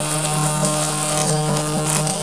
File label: Electricity